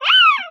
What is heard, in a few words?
meowp